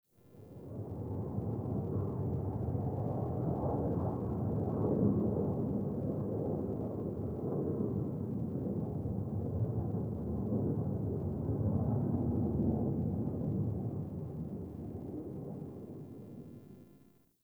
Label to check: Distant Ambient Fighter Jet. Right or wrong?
Right